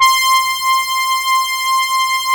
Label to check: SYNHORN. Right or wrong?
Right